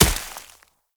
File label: Snow Ball Impact; Warframe